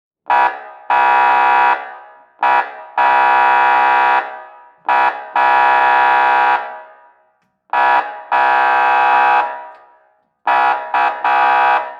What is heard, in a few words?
Transportation Police Boat Honk Air Horn